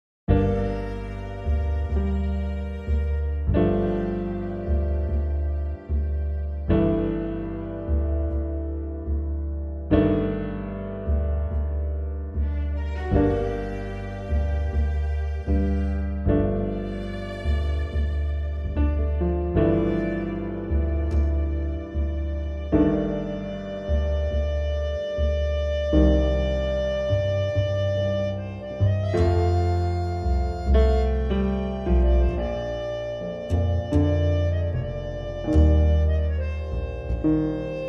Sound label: Tango